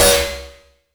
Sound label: Stab Crash; FREE SFX PACK VOL 2